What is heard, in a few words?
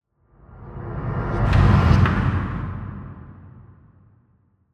Cinematic Transition; SoundBits; Cinematic Hits Transitions